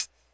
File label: hihat; Big Fish Audio; Hardcore Breakbeat Action